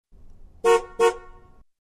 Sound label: Car Honking Twice